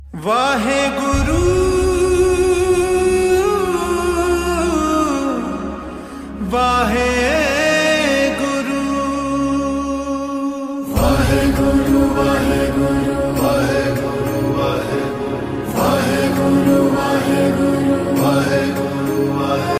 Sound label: Waheguru Ringtone